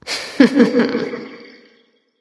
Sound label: heh heh light; smite; voicepack; hel